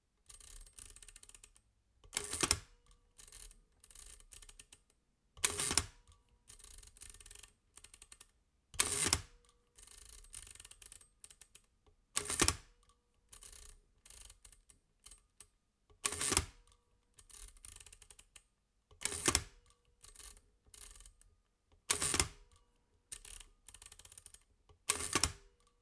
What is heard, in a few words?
CX52, Pin-and-lug cipher machine; hagelin; crypto